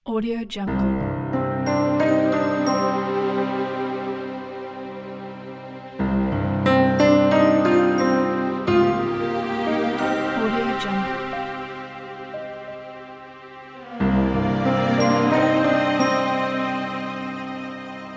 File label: sad acoustic folk